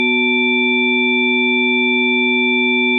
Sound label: Mix 5 sinus